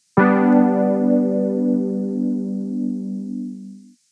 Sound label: Crawler; j106